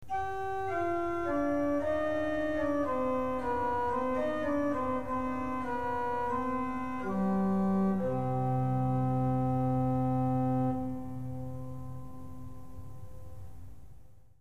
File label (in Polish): oktawa 4 z 8, organy